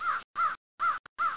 CROW; Image Bonanza; Expert Software; animal

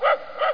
bark

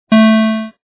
start; race

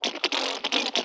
robot; The Interactive Alphabet

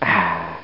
daahh

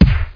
Kick

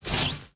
ice shoot; graveyard

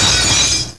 Broken glass; Strategy; Maze; Gamma Zee 2.5.1ß

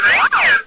restart; MOTOCROS

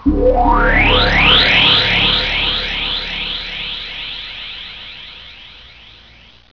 Warp; WARLOCKSNDS

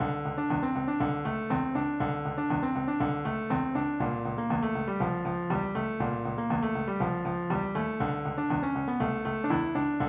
funk; 3800 MIDI Magic

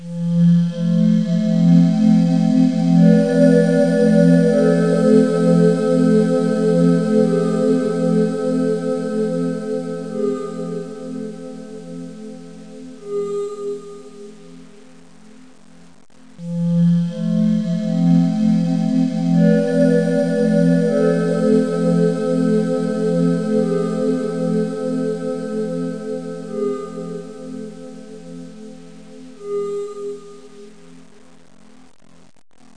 victory; Deface

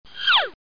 zip Up; Bible Fun Pack; MAZE

